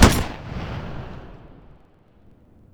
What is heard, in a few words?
m2 mg fire; WAR